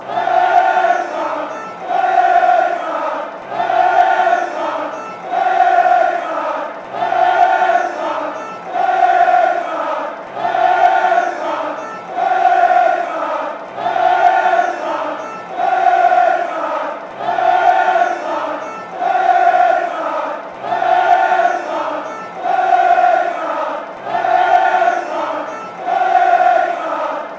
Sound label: stadium fan chants